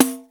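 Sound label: pandeiro open; East West; Global Sounds; R8M PERCS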